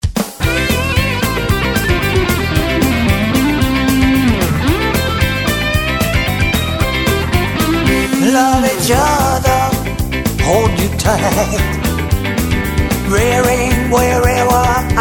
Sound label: My guitar and me